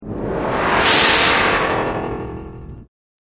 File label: SLO WHIP; Sound Design; Partners In Rhyme